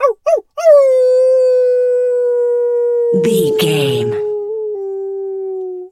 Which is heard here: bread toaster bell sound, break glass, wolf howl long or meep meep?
wolf howl long